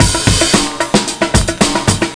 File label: Mondo Bongo; Zero-G; Beneath The Planet of The Breaks